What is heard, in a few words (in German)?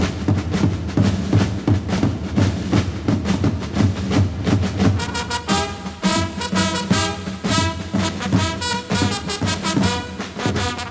Rumba